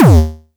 analog bass drop; analogBully; Ableton Foundation FreePacks; Wicked Kits

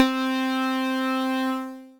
Pluck, synth keys; Wavetable; Plod Pluck; Ableton; Synth Essentials

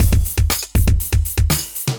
Acoustified Kit, Drums

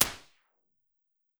Small & Bright Amb; Convolution Reverb; IRs; Ableton

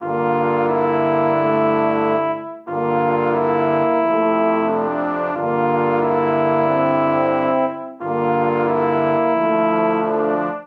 Phrase Apprehensive D Minor 90 bpm, Brass Quartet; MIDI Clips; Ableton; Spitfire Audio

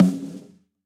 SNARE drum keys

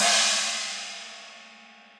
China Classic Muffle, Cymbal; Drums; Drum Hits; Standard Hits; Session Drums Studio

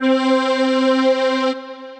Razor Light Pad, Synth Pad; Ableton; Factory Packs; Build and Drop

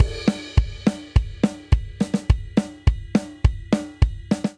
Country Outro 209 bpm; 4 4; MIDI Clips; Ableton; Factory Packs; Drum Booth

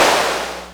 Clapbox Noise; Ableton Foundation FreePacks

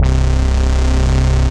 Basses; Ableton; Factory Packs; Creative Extensions

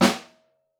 SN Room, Snare; Ableton Foundation FreePacks; Snare Designer

